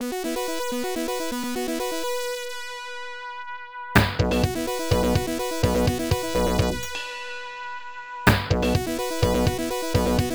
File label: Freezing Brass; Telenet Music Box; X68000